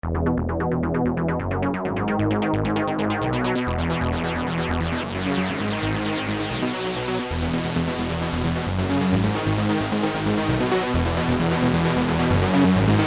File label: MiniMax